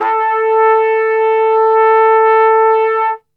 A 2, Brass; Best Service; Brass Super Section

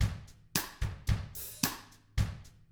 111 COUNTRY; ILIO; Double Platinum Drums 1

